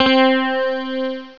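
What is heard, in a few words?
electric guitar C3; Star Trek JR Audio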